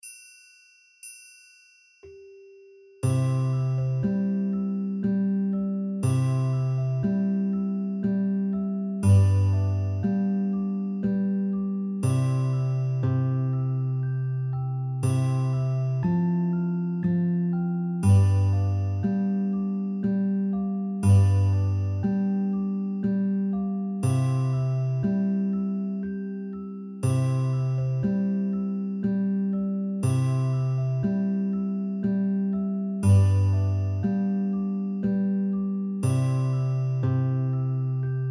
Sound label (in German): o tannenbaum in g